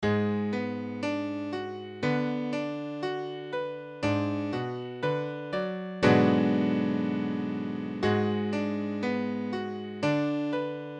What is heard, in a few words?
Abm7 5b, piano